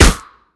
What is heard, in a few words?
hit; smite; tiamat